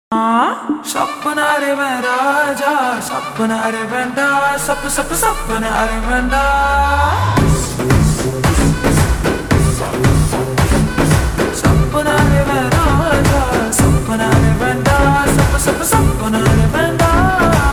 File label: Slow Reverb Version Ringtone, Tamil; Pushpa 2